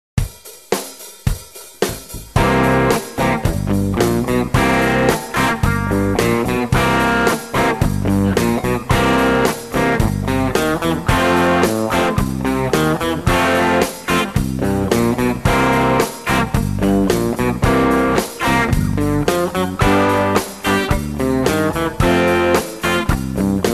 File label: Rhythm Guitar